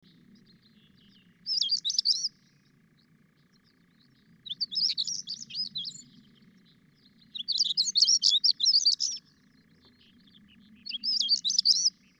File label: Saxicola rubicola song; passeriformes; muscicapidae